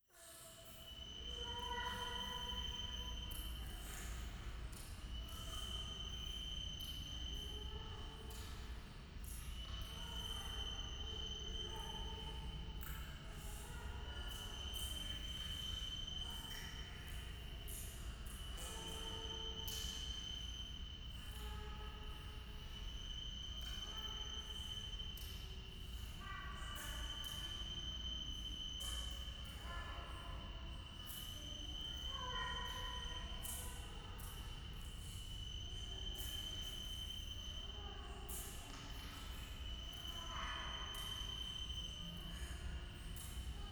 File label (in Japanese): 廃墟 イラマチオ 山奥